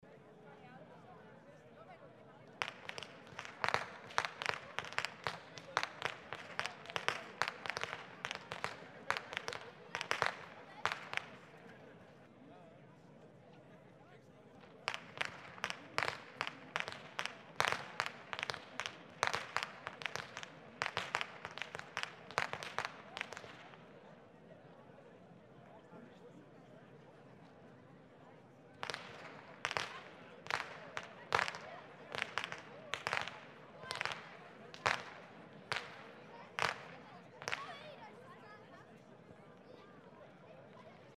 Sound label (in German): schnalzen, jugend; waging